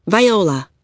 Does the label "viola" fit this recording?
Yes